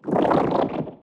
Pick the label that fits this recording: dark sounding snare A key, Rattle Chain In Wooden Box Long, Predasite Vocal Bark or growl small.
growl small